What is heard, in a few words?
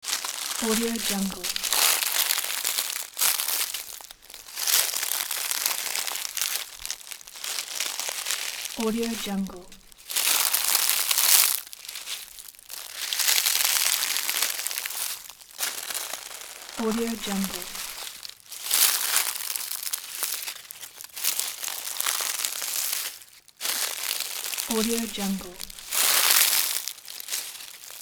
footsteps in leaves slow loop